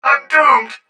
droid target droid

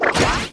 ui tech use

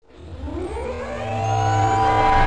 ship hyperspace begin

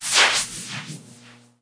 force flip

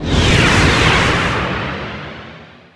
fire off

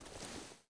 item stand from

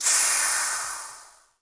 item hopper input gas

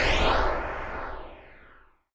force blast end

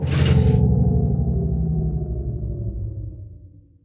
sys gen power decrease

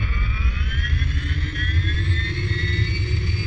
eng accel bwing

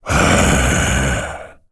afflicted m aggro

